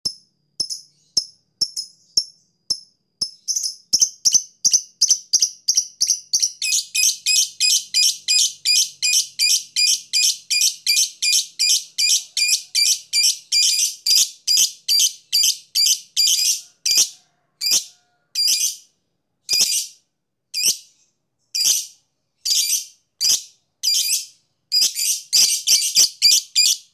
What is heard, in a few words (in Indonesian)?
suara burung lovebird